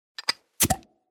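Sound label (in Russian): звучно открывается крышка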